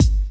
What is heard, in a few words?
Dance Drums; Best Service ProSamples vol.15; AKAI